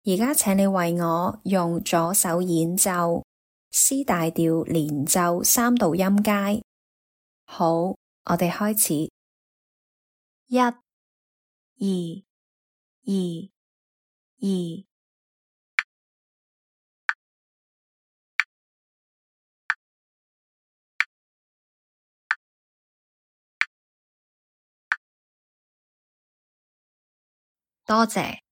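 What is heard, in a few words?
C major legato scale in 3rds left hand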